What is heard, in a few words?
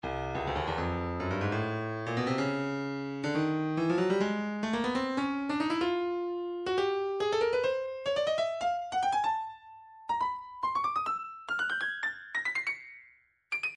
breakbeat midi 070 bpm urban tight; Stylus Breakbeat Midi Files